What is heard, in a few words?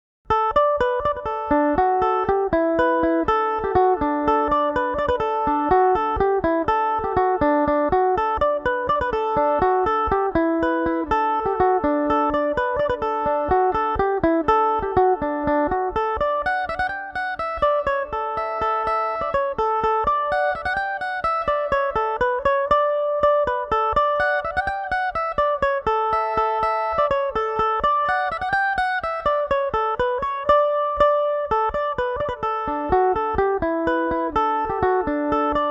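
D major belmuse, irish mandolin; The £42 Cheque; Revelation Jaguar electric mandolin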